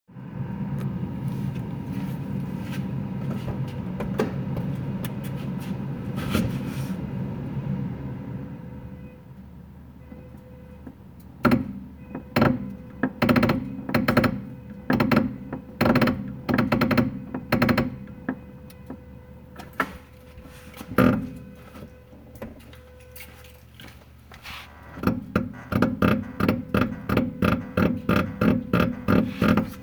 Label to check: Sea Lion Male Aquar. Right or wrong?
Wrong